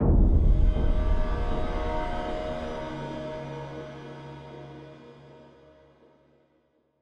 Stingers sting pain

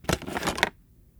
Arrow Spawn; Timelock VR

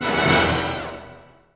Combo Stab; Bee Movie Game

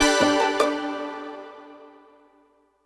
Jingle Caught Fish Medium; AR Games

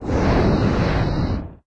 Fire

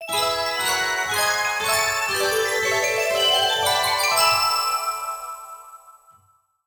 Horse Racing - Bond Level Up; mario sports superstars 2017 3ds gamerip

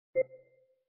menu button beep; Coffee Shop

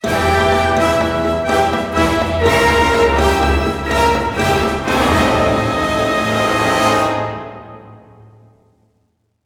Sting; Evil Genius 2 World Domination; PS4; Xbox Series X/S

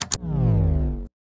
Powerdown; Good Pizza, Great Pizza; Android

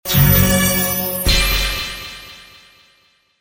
summon

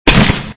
Hammer Impact; Extra Lives

